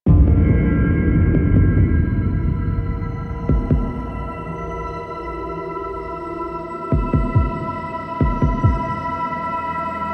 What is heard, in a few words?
Quest Prelude, Music; Warframe; Zariman Quest